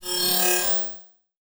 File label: Multimedia Internet CD-Rom Flash Animation Musical